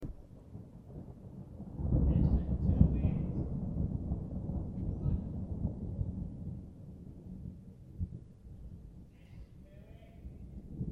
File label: thunder swifts calling and rain last night